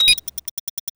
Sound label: ui suitcase bomb; user interface